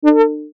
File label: Chime Musical, Chime Musical Multimedia; Synth; Multimedia Web Interactive; Chimes; Blastwave